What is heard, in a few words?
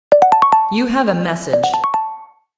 You Message